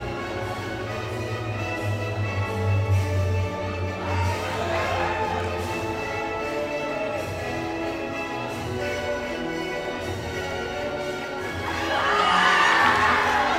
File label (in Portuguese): Apresentacao com musica plateia reage e ovaciona; multidao